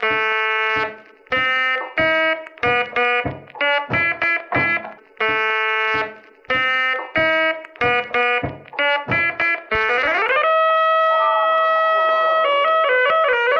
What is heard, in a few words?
live keys, Electronic Loops